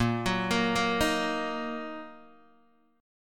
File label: Bb Suspended 4th Sharp 5th, Bb, down, Guitar, Open D